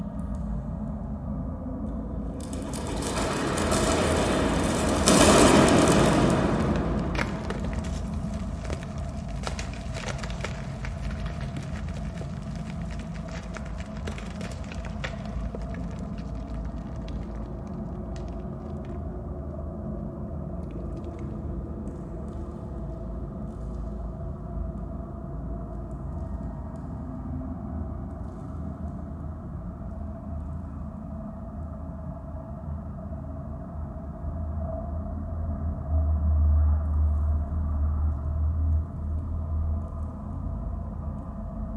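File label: ambience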